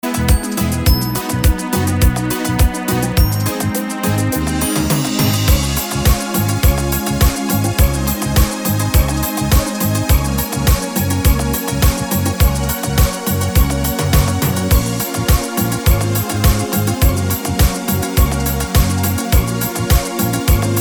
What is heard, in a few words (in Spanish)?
cumbia